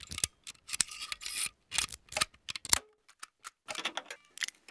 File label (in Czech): otevirani trez; Mafie 1